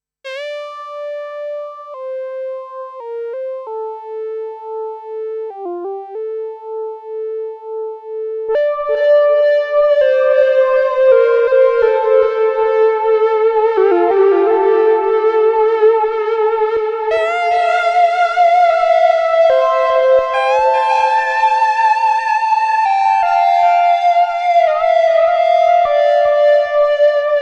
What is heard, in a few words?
synthesizer; eventide h90